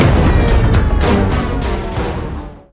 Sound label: World Travelers Death